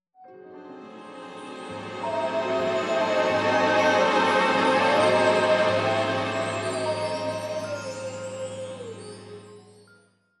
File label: HALLOWEEN